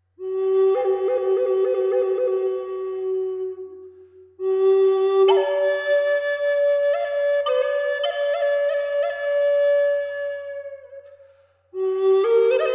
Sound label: Solfeggio 396 Hz